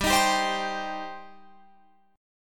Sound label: G Suspended 4th Sharp 5th G, down, Guitar, Drop D